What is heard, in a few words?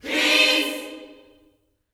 PEACE CHD, Vocal Planet Gospel GOSP CHORD